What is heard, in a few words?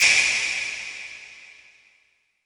SNAP ROOM; pcm60